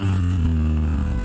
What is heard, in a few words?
moan long